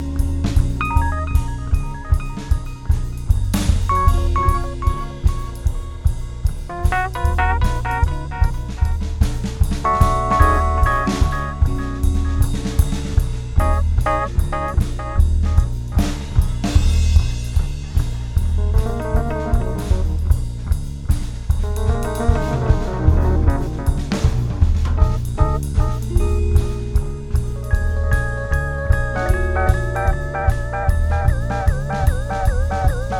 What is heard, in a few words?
echoplex